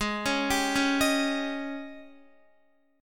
Ab Major 7th Suspended 4th, Guitar; arpeggiated; x,11,13,12,13,11; 4F,4A,5C,5G,5C,6E; AbM7sus4; JGuitar

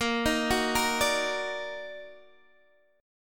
Eb Minor Major 7th Bb, chord

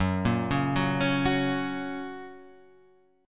F sharp Major, Guitar, Half Step Down

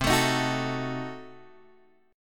C Diminished 7th down Guitar Drop D

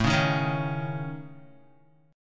A Major Flat 5th A Guitar, chord; AMb5; strummed; JGuitar

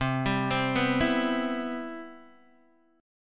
C Major 7th, Guitar DADGAD

